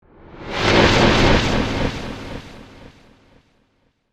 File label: Magic Vanish Whoosh, Horror, Magic, Vanish, Whoosh